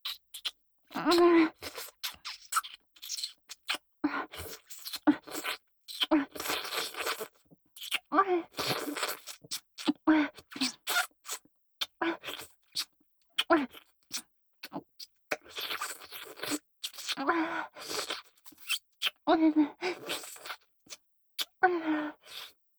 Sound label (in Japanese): フェラ吸い